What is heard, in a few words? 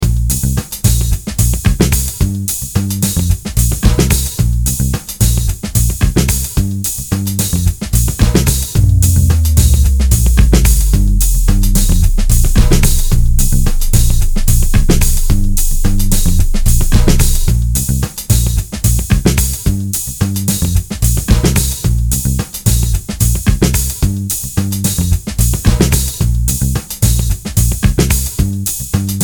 boom tish